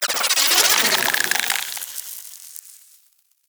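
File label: Proto Infested Mutalist Projectile By; Warframe; Abilities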